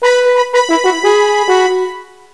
PROMOTE; MPG-Net (USA)

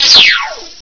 Quick Move; Loony Labyrinth 1.02 Demo

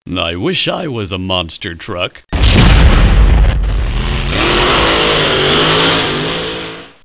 Blue truck

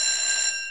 ringi; garfield

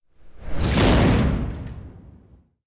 vault door; The Sum of All Fears

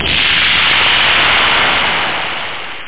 Disintegrate

